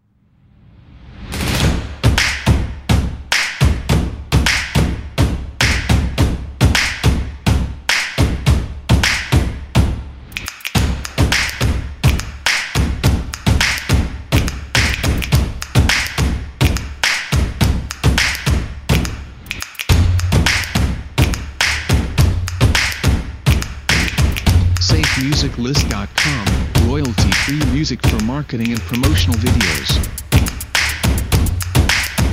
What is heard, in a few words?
Urban Percussion Stomps And Claps